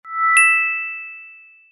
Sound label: brilliant, apple watchos 11